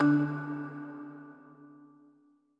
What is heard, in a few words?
marimba; Sky Children of the Light gamerip